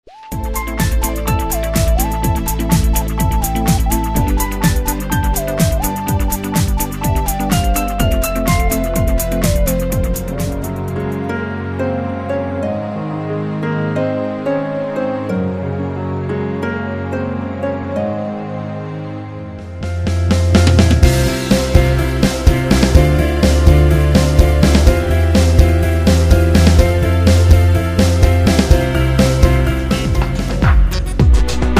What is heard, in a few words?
Background and On Hold